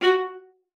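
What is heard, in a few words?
F#5, viola spiccato; Xsample VOL-5; 90 s Sample CDs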